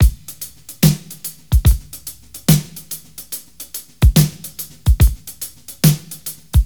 72 bpm drum loop a sharp key

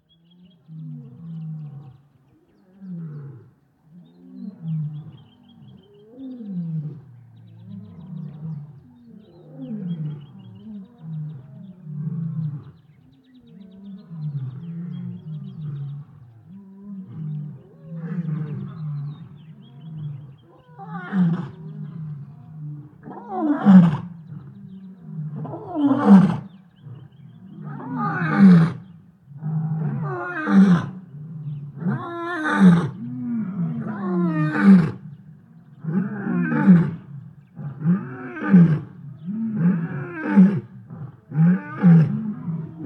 lions in savannah; soundscapes

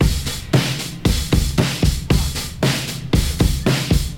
115 bpm hq drum loop sample f sharp key

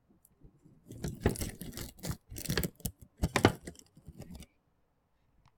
kitchen table noises crashes

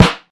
tight snare drum sound f key; snare-drum-samples

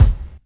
steel kick drum sample c sharp key; kick drum samples

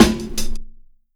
warm sounding snare sound a key

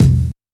rich mids bass drum one shot c key

